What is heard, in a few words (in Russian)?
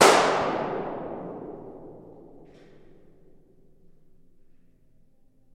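воздушный шар взрыв одиночный удар в пространстве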